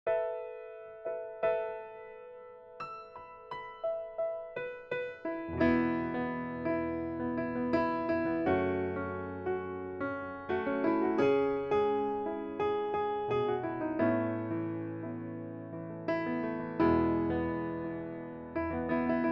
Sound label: ACCOMPANIMENT; Moana